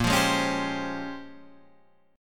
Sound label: A sharp Minor 13th A sharp, Guitar chord